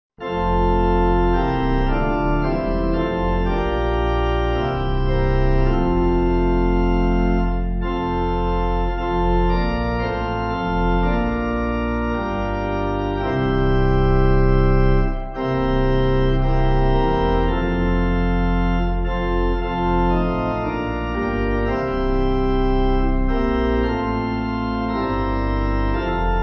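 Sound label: Pipe, pipe organ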